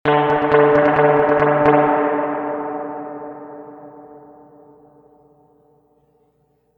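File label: mod reverb tank 3-spring long staccato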